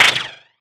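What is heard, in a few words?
impact bullet generic titan shield; Titanfall 2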